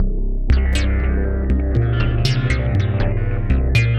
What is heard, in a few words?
Stepper Bass, Bass Foundations; Sonic Foundry (Sony Creative Software); The Electronic Music Manuscript Collection